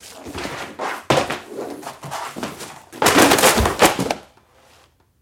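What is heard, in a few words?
Book Thrown Off Shelf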